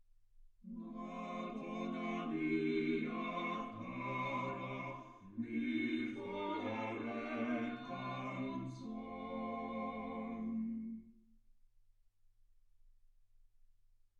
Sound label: quartet